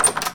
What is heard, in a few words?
default locked, door rotating; hl2; doors; func door rotating